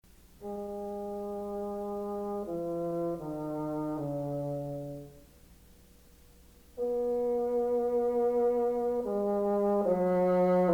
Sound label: bassoon